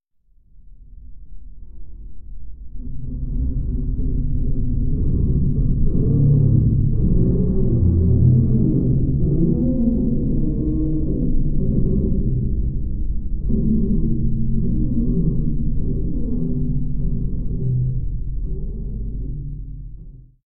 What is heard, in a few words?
Underwater Int Low Me, metal groan atmospheres; Underwater Int Low Metal Stress Ver 2